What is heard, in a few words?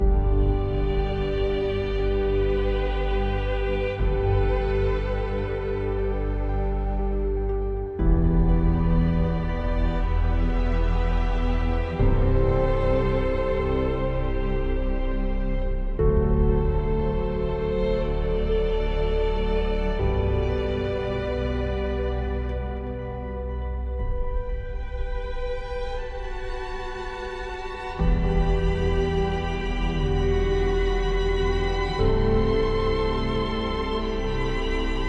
Embrace Ambient